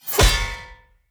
sword hit; Shelter

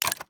object open chest

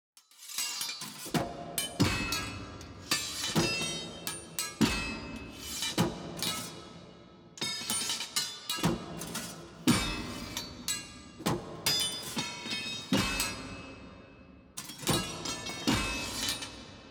ambience room polygon fighting arena

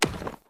npc tree golem little projectile

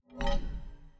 mob spawn

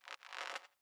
zap

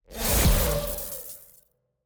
Exhaust Crystal Player Attack; TANK; 3Souls

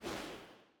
ambience room shadow room assassin block open

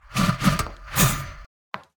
npc strike

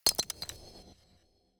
dark crystal obtaiment

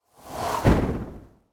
npc oracle strike

